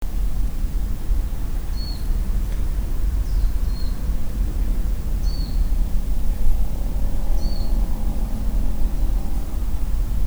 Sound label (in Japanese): mejiro, tori